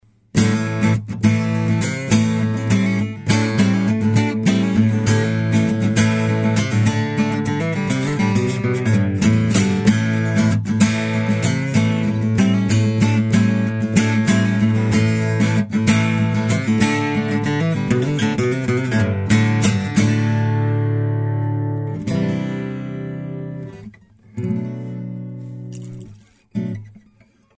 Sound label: Sample, guitars